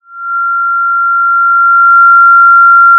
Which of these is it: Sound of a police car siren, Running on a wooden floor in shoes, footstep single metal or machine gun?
Sound of a police car siren